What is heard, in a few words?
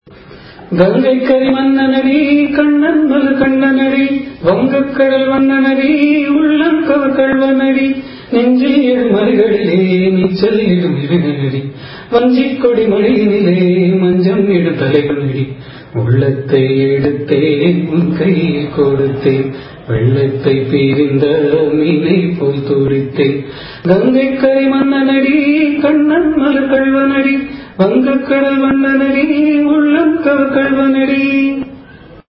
raga thodi cine; carnatic music